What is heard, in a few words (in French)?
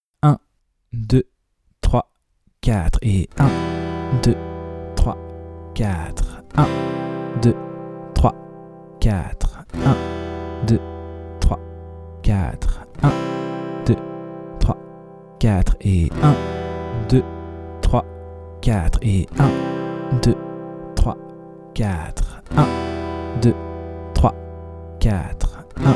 moyen Passer de Em à C